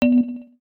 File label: electric sharp, keytone; SKY IM-A800S-VEGA-LTE